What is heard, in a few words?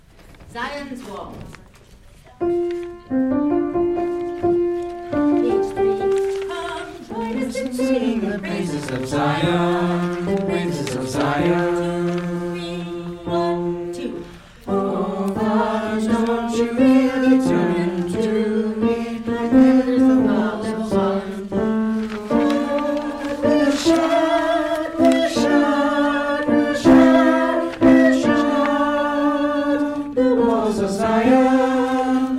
TENOR